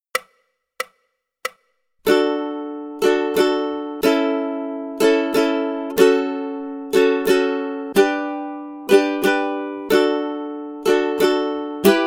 rhythm study, ukulele; grade2

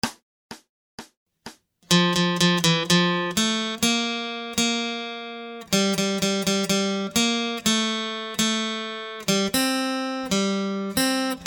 Acoustic Accompaniment, acoustic guitar

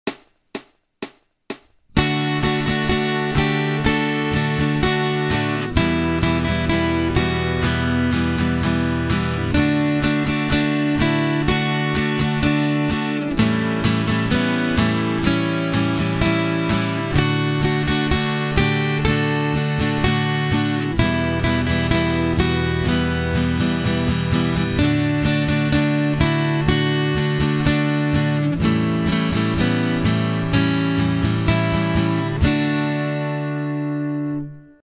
Acoustic Accompaniment, acoustic guitar

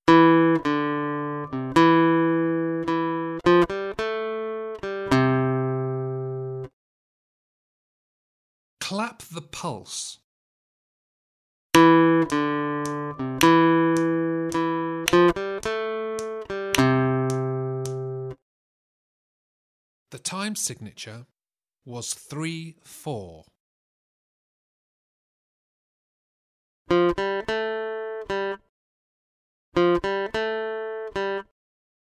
Aural Rhythm Test, acoustic guitar; Grade 2

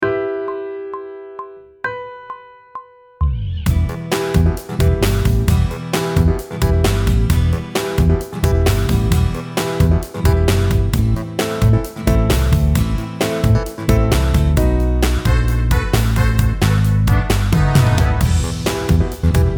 Sight Singing backing track, pop vocals; Grade 7

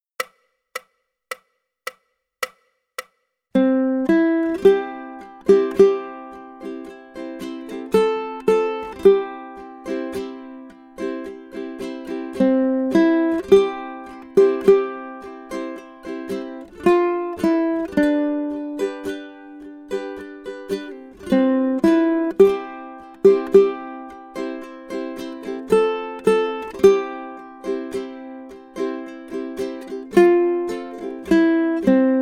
Kumbaya, ukulele

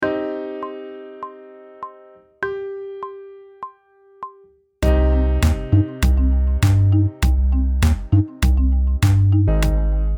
Sight Singing backing track, pop vocals; grade 6

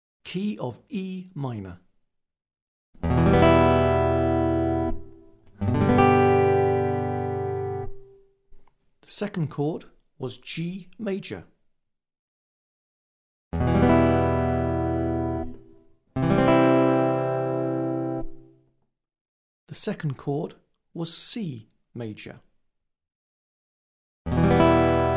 Aural Harmony Test E minor, acoustic guitar; Grade 7

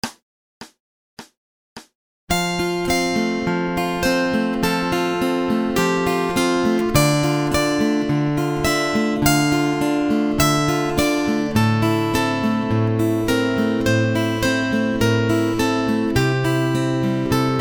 Acoustic Accompaniment, acoustic guitar

